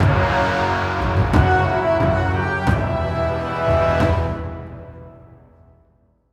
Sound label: Legendary Stinger; Guff The Tough